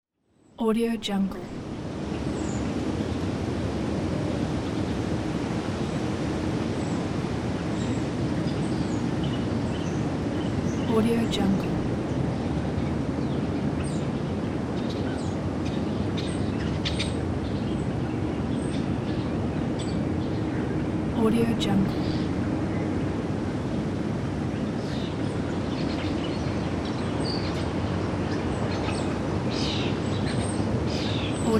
city park; capeaudio

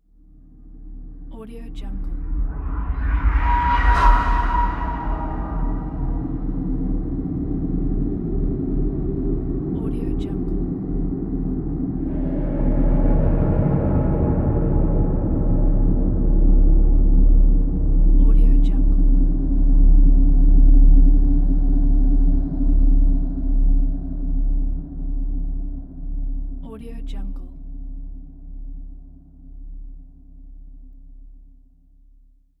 dark ambiance

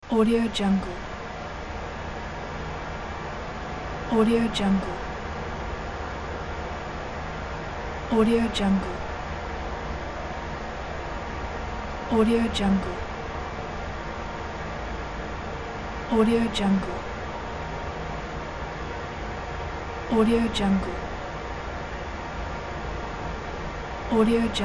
room tone futuristic ambience; sonic-boom

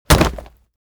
impact body fall concrete leather hard

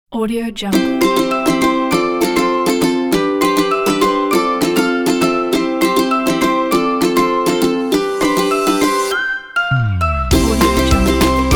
positive upbeat vibes

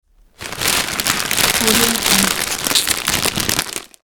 packet of crisps

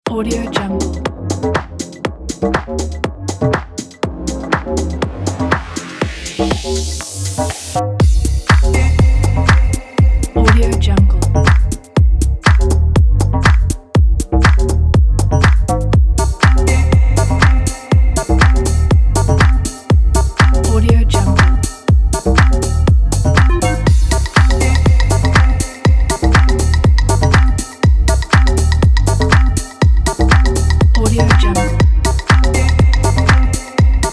luxury summer deep house

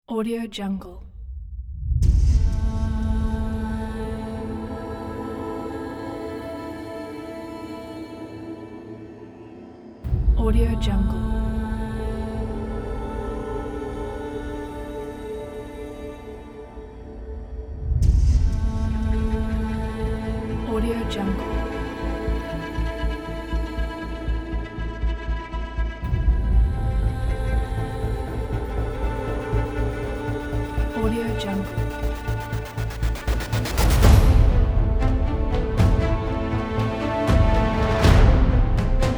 cinematic trailer

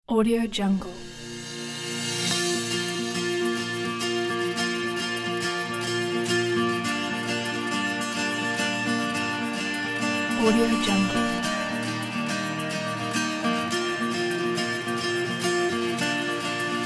inspire guitar

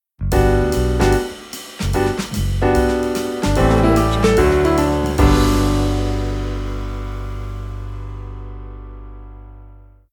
retro jazz piano funny logo

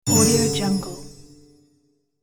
magical harp fail

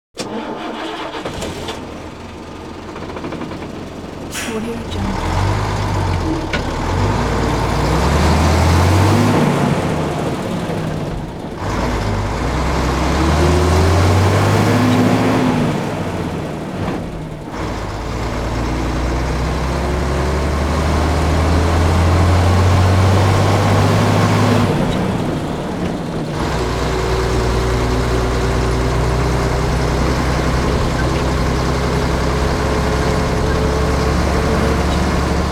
truck idles and rides on for short distance; hollywoodedge

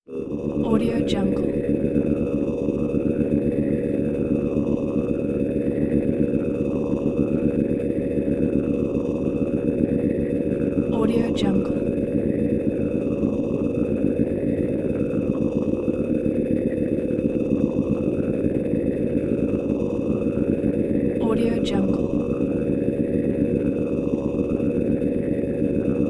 scifi lofi rotation

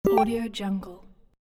8 bit confirm

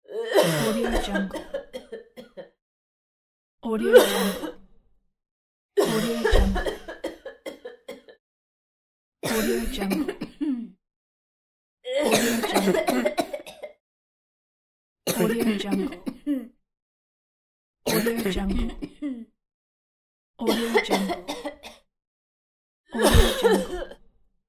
female and childrens strong cough bronchitis; coronavirus

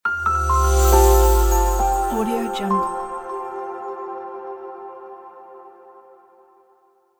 elegant soft piano logo

